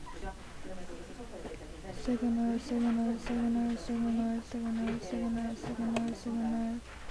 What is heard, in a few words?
signal